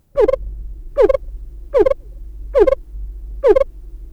Enchenopa, treehoppers; Cocroft

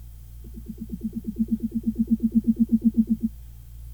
Hypsoprora, treehoppers; Cocroft

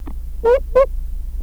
Micrutalis, treehoppers; Cocroft

